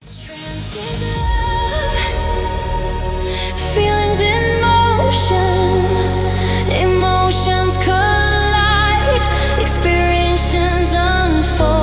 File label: trance music